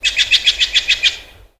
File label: Rand Bird